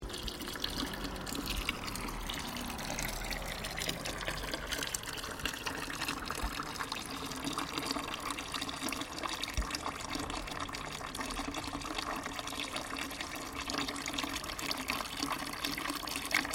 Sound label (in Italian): suoni fontana; borgo sacco